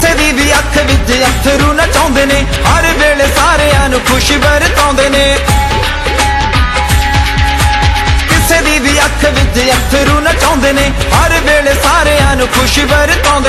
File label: Punjabi